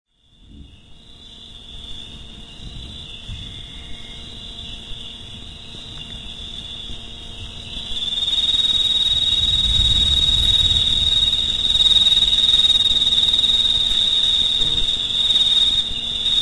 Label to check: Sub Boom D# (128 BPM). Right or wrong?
Wrong